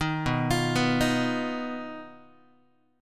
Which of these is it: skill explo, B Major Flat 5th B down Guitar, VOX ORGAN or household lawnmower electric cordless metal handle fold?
B Major Flat 5th B down Guitar